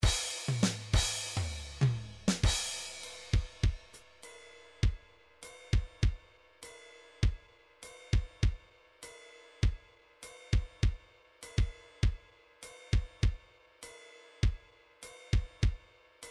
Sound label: midi drum patterns drums